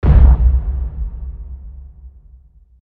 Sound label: Subwoof; chivalry medieval warfare 2012 pc